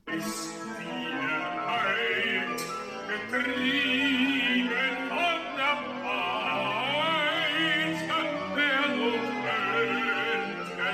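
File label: basses; Cardillac; dyrygent - Joseph Keilberth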